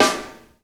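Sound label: snare drum funk; E-Lab; X Static Goldmine 2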